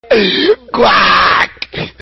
cuack

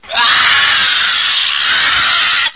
jaaa

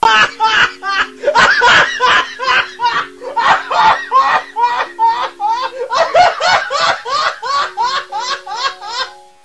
jajajaja

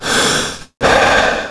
Respiracion; DAMAGE

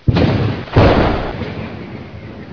rayo

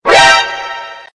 alerta

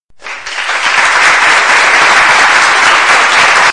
aplausos

